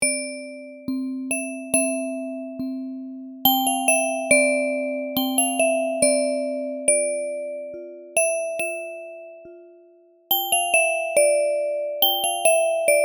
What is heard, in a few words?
Bells At Work, Ambient Bells